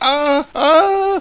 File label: scream